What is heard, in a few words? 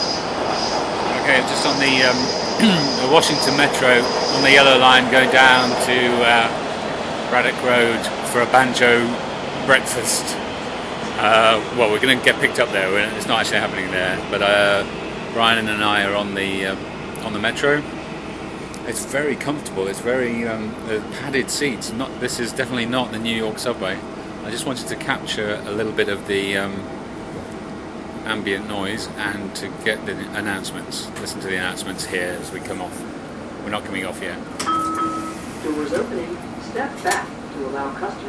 dc metro